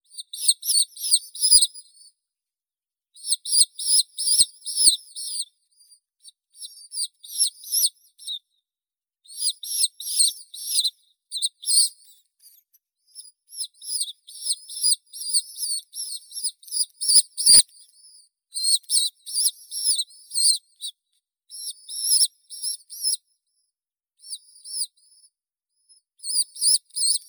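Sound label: rock dove, Columba livia, chick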